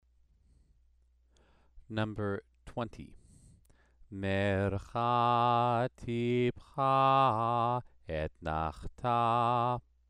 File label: Torah trope exercise